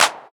clap single shot d sharp key, Royality free clap sound clip tuned to the D# note; single hits one shots